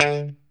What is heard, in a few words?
POP D 3, STRAT, Guitars; Spectrasonics; Hans Zimmer Guitars VOL-1; JC-STRAT POP